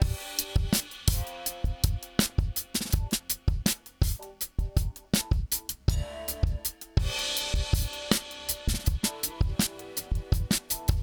Chandler Limited Zener Bender Software DRUMS LIMITER; Softube